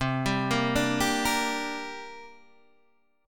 C 7th Suspended 2nd C down Guitar Open D

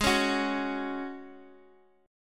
G sharp 7th Suspended 4th, Guitar, DADGAD; down; chord shape

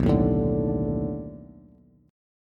B 7th Sharp 9th B down Bass; B7#9 strummed; chord shape; JGuitar

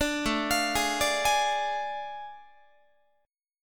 A Major 7th Suspended 4th Sharp 5th, Guitar; AM7sus4#5; arpeggiated

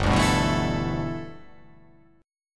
G 6th Add 9th G, chord

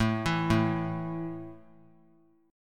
A 5th A down, chord; arpeggiated

